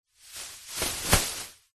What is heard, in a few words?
foley plastic bag bin liner shake out to open; zapsplat